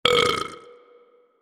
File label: drunken rage burp; gragas